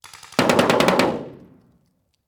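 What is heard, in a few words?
Paintball Gun Impact; Sound Ideas; Guns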